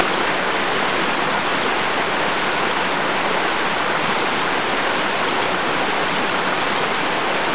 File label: rain